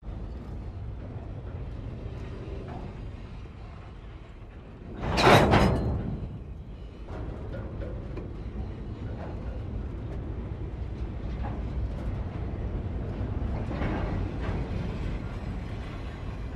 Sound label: Railroad Cars Coupli, steam train